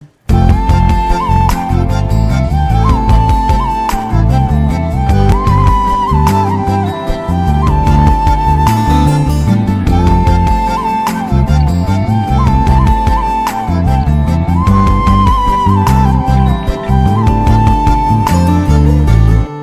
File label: Flute Ringtone